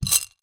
marbles lots in glass container put down on surface